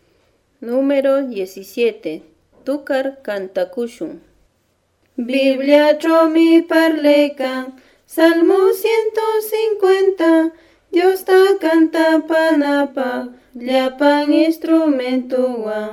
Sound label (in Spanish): cancion